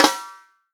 snare drum, HIPHOP SNARE CHROMATIC; ProSamples vol.43 - Real Drum Kits

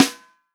FUNK SNARE CHROMATIC, REAL DRUMS

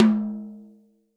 tom, Real Drum Kit, funk kit

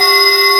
G4 tube bell; Northstar; The Wizard VOL-1; FLUROBELLS

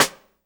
sidesticks; Spectrasonics - Backbeat

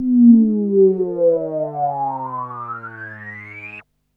FX, Drum & Bass

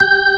ORG, Organs

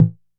perc, Novation Bass Station 2

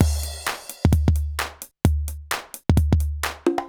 Alesis HR-16B (130BPM), beats

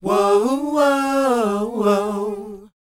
WHOA G#, Vocal R&B & Dance; ILIO; Vocal Planet

